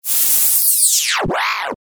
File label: interference